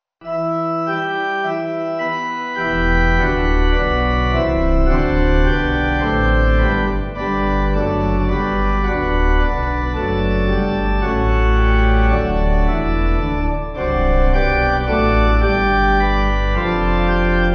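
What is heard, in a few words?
pipe organ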